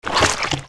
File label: Spore attack